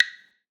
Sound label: clave; eddyflux